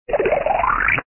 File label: run; hit the looser